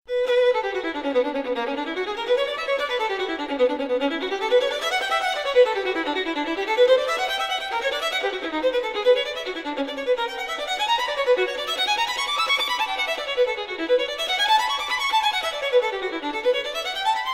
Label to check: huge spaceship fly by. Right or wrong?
Wrong